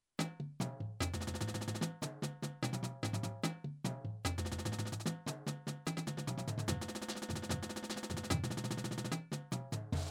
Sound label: Drum Break; Orange HS (excerpt 2)